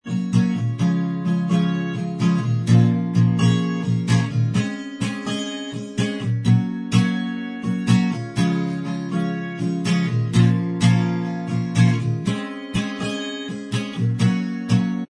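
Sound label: acoustic style, instrumental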